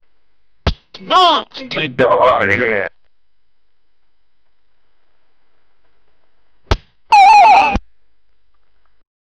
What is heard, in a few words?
the billowing sails of a